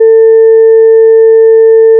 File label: fm voice single; FFTimbre